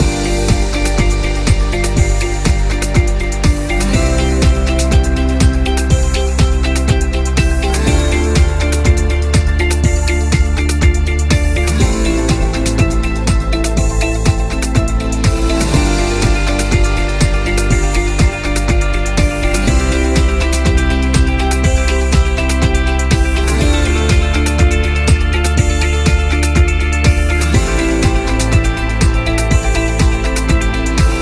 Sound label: Intro Loop; corporate background